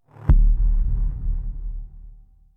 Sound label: winnernotification